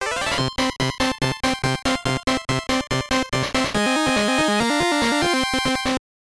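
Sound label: Jingle, Super Game Boy; Chou Majin Eiyuuden Wataru Mazekko Monster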